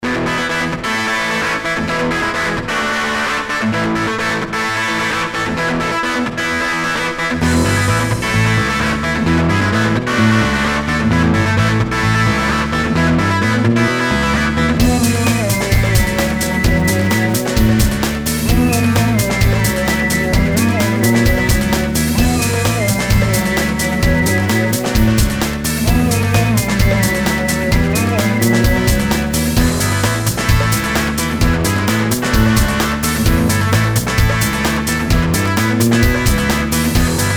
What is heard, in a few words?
Metal Game